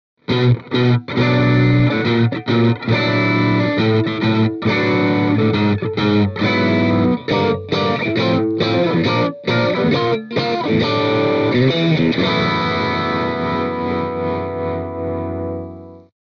Celestion Vintage 30 Crunch Stratocaster

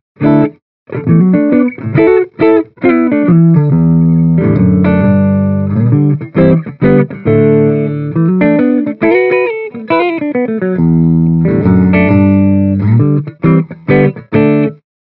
Mic Inside Clean Les Paul; Mini Rox; Nordik Gjallarhorn

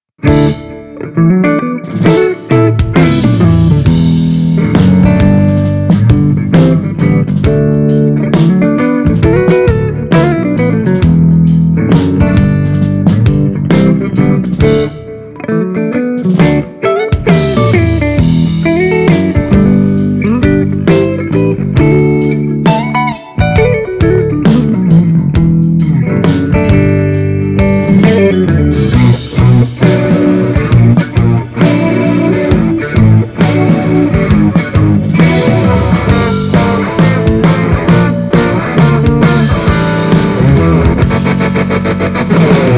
Mini Rox Mic Inside Nordik Gjallarhorn Les Paul

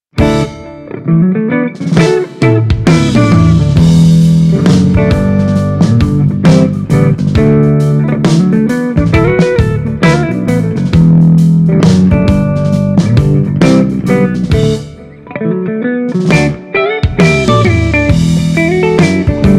Mini Rox Mic Inside, Seventy 80, Les Paul

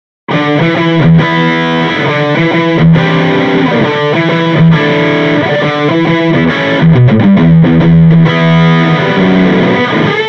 Mic Inside Jensen C12N Distortion Les Paul; Mini Rox

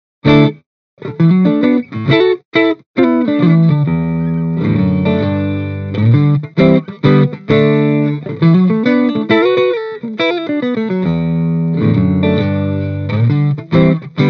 Mic Inside Clean Stratocaster; Mini Rox; PRIVATE JACK

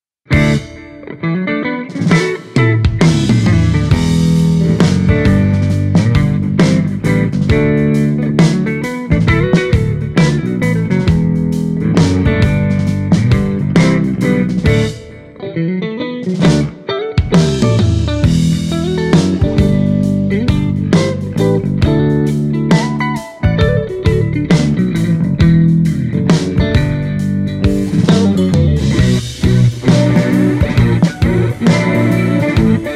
Celestion G12T 75 Stratocaster

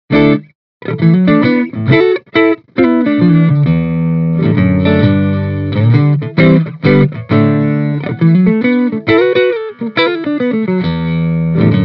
Mic Inside G12H 65 Clean Stratocaster; Mini Rox

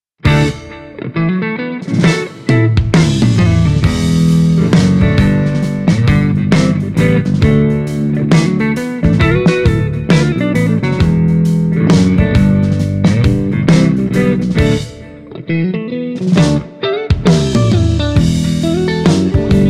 Eminence Legend GB128 Stratocaster

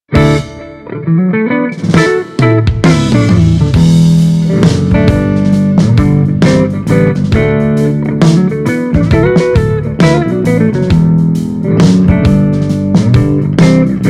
Mini Rox Mic Inside G12H 65 Les Paul

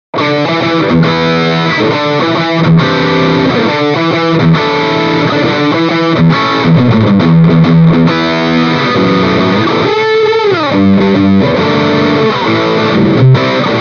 Distortion, Les Paul; Mini Rox Mic Inside

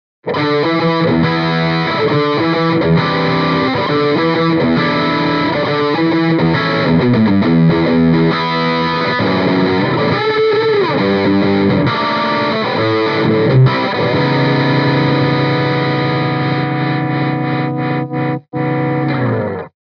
Mini Rox Mic Inside G12H 75 Distortion Les Paul

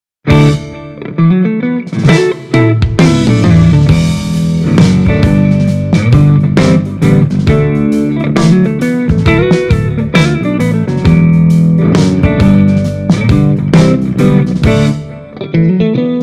Stratocaster; Mini Rox Mic Inside; Seventy 80

